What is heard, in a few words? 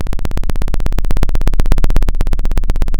Sound of a plane taking off